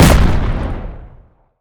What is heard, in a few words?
bullet shot